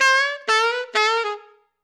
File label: Laugh; The Act; Arcade, iOS, MacOS, Mobile